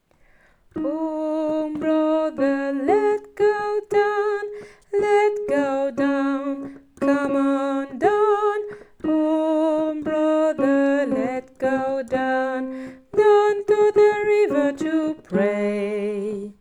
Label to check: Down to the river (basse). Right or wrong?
Right